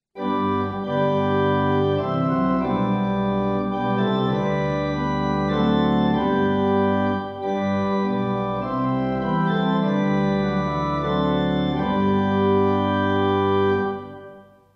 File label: Hymn organ; As longs the deer for cooling streams; Martyrdom